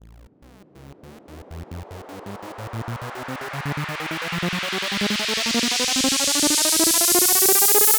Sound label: progressive house riser 8 seconds